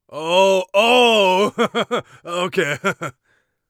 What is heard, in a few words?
laugh, Warrior Voice; Warrior Voice Pack